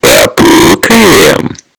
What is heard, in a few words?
earrape